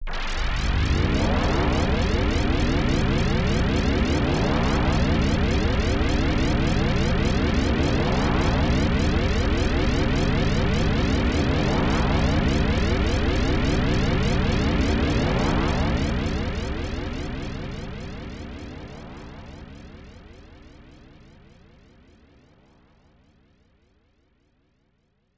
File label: Electric Chair; total carnage; arcade